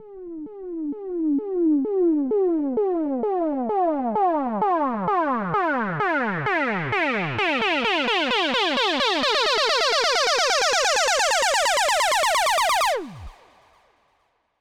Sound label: Lazers